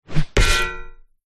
warfare weapon heavy axe swing hit body against wood clang impact squench; zapsplat